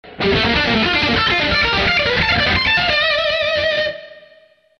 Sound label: Lick